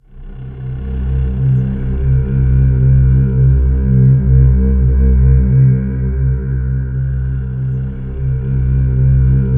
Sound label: C3, Synth Leads